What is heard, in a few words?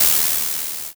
soda